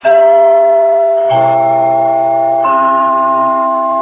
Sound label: chime; ATV